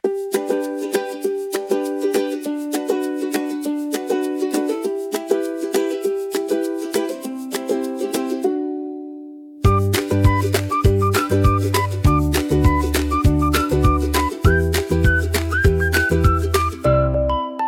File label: ukulele tropical for bright and light